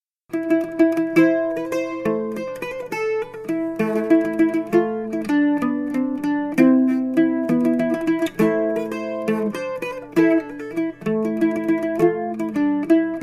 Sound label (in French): Fandango lent; atelier danse